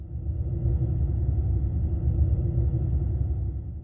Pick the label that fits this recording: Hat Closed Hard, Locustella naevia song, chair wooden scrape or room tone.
room tone